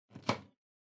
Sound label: Power Strip Floor Gentle Unplug